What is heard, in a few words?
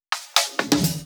Fill 128 BPM; Vengeance Samples; VEH1 Loops